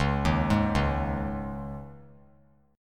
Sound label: C sharp Suspended 2nd, C sharp; C#sus2; arpeggiated; JGuitar